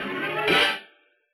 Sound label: Hit Brass, Yamaha DJX